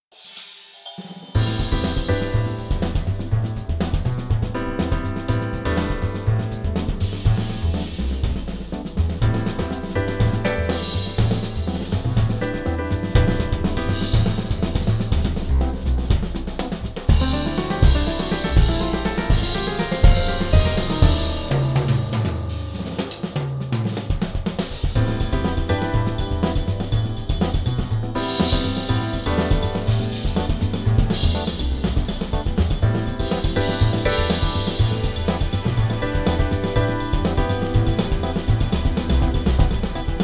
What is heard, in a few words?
ambient stick; tascam